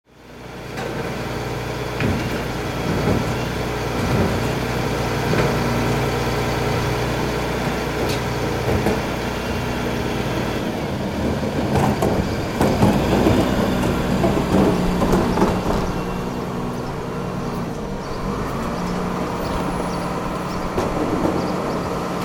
Refuse collection vehicle collecting and crushing rubbish; Garbage Truck; Trucks; Vehicles